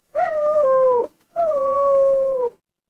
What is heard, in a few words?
animal cat meow